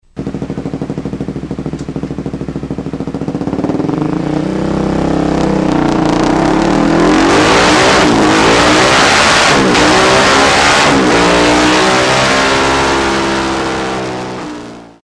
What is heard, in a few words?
yraptor 700